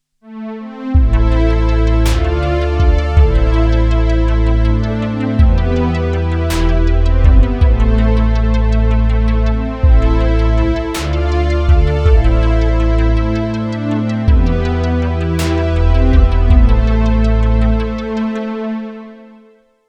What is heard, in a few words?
juno 106